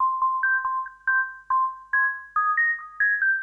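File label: Trance, Techno Melody; TechnoMusicMaker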